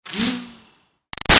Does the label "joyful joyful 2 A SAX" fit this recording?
No